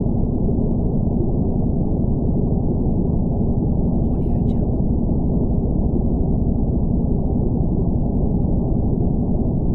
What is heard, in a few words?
large submersible propeller loop